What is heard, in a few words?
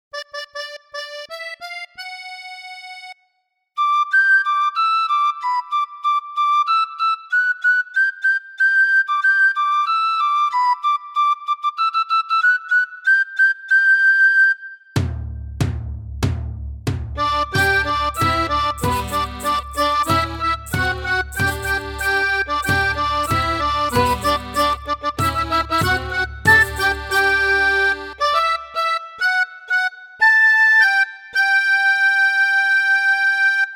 A Sailor Went To Sea Sea Sea karaoke